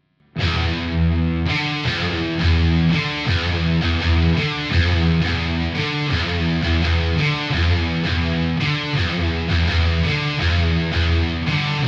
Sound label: modern no boost, mesa boogie dual rectifier